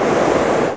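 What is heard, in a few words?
prop blast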